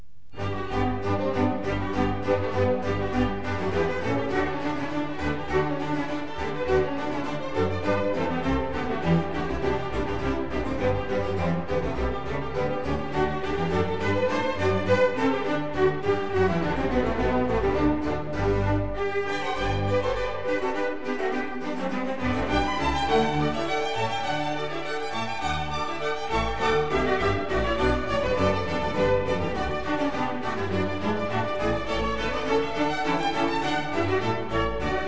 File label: Viola da Gamba